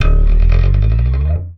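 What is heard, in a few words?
slap, bass; Xsample VOL-1; SLAP+THUMB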